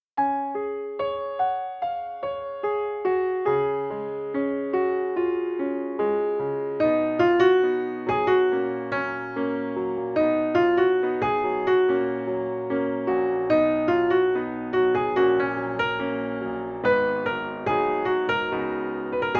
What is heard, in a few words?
FOLK Melody; Kibibi